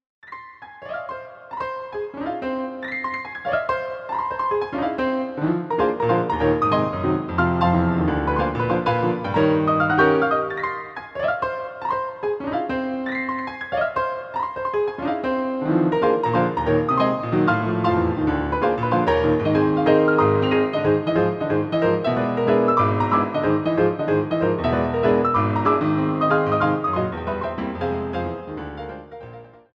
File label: Triangle Jazz Blues; Leclere